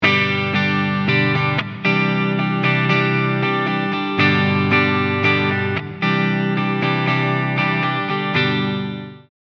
Clean

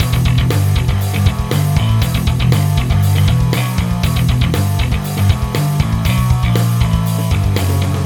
MODO BASS SR5 Tri

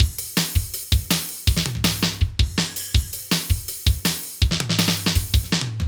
drum mix wet

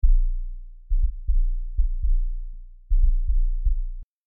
Mini V VCF 30 Hz emphasis

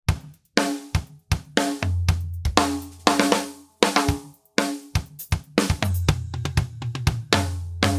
rev on effect; BFD

